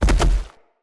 Stag Gallop Vegetation, Footsteps; Animals; Foley

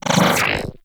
Bullet Attractor Cast; Warframe; Mag

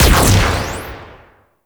Shotgun Shot 3D, Weapons; Warframe; Corpus

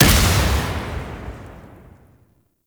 Fire Ball Cast; Warframe; Sargas Ruk

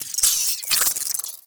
Tiny Creatures; Warframe; Corpus; Railjack

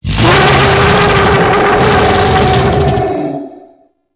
Vessel Close Scream; Warframe; Tau; Sentient Vessel

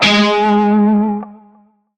Shawzin Flourish Oct Two G Sharp; Music; Warframe; Narmer Shawzin

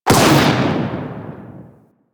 Raptor Mortar Launch; Warframe

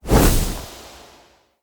Casting Device Cast Left; Weapons; Player; Galene

